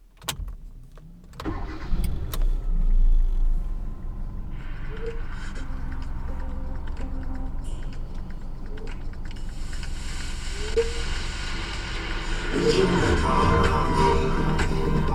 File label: car start